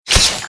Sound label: impact blade